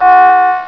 Ship's Alarm; HyperBattleship!

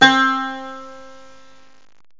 saz, arabic

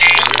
Boingity; Mosaic Magic

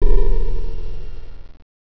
sidehit bowl low (3 octs); Shatterball 0.29a

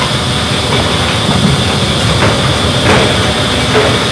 electric cart top speed; vehicle; Spearhead Demo; MacFormat 2003 June

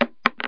brass wood; 11,025 sample rate; 0.06 seconds; SpecOps2MPlayerDemo

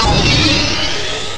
Yellow Death; Icebreaker

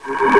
Spell Appear; CHECKERMANIA 1.04

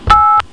phone

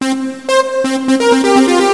fuzz riff 125bpm; Terra Sound Library; construction set

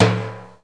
boom

